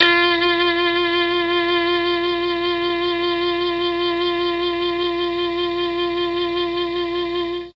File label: F3 MKII sax, mellotron